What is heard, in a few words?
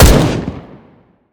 fire, weapons m16; Urban-Coalition; Decay; arccw ud